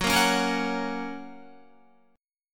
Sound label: Gb Major, Guitar; Gb strummed; JGuitar